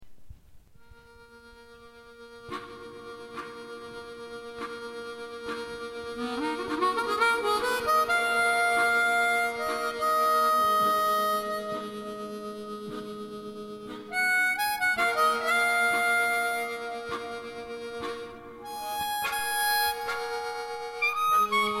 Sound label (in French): essai eb mineur